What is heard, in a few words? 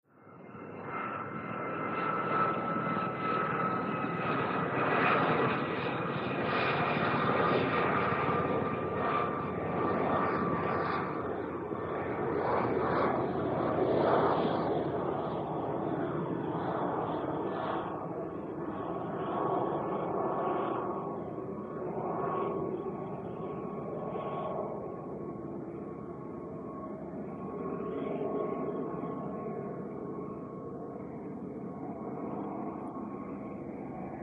HELICOPTER JET: ROYAL AIR FORCE: EXT: By, away, distant flight, approach, by medium, away. Slow.; heli fx